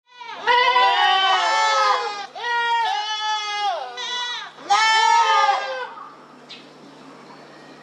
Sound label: goat